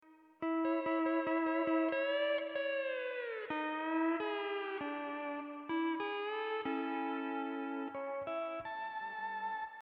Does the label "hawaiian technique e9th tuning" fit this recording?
Yes